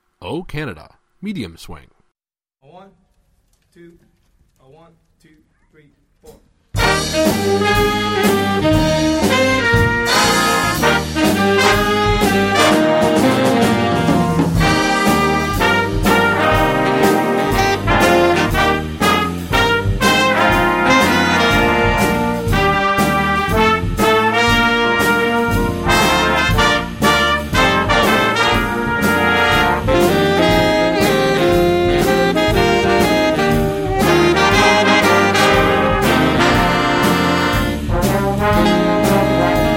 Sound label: Medium Swing; O Canada